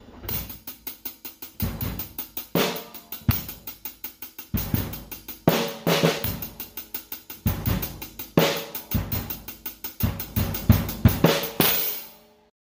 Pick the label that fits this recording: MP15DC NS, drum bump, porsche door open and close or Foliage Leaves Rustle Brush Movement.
drum bump